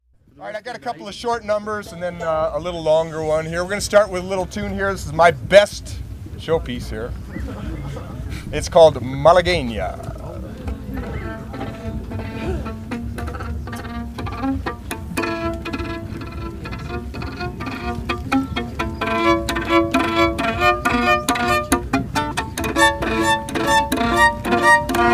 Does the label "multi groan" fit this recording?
No